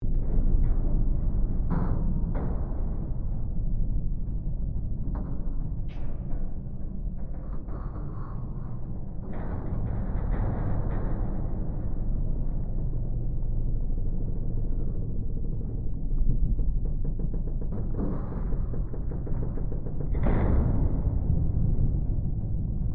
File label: Created Ambience Ship Hull Engine Creaks Impacts Shifting Ice Loop, Created environment inside a ships hull; Created environment inside a ships hull (Spaceship or Seaship); Artificial Sound Effects